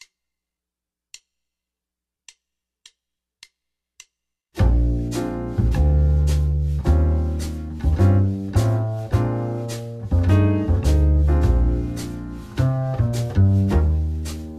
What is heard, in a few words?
c blues, saxophone